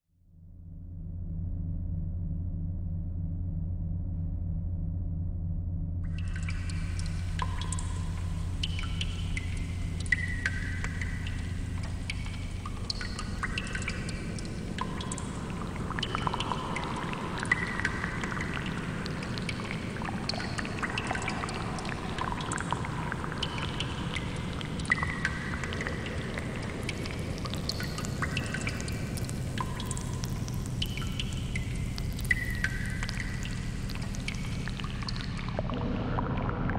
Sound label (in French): silence des grottes